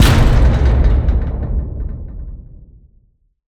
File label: heavy metal impact factory door vibrating; Cinematic Impact; Bluezone Corporation